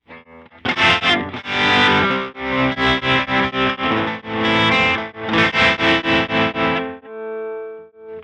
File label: LFO square slow; Moogerfooger MF-102S